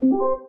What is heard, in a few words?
Pad Notify; Asus Padfone-2